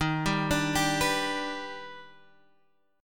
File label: Eb Augmented Major 7th Eb down Guitar Half Step Down